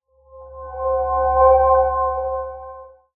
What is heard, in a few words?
dancing lights, cantrips